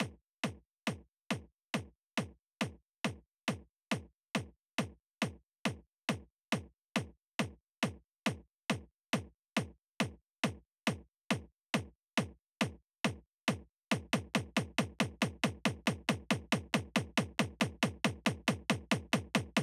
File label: Kickroll Intro 138BPM; Drums & Perc; G#minor; Vengeance Samples; Manhattan Kit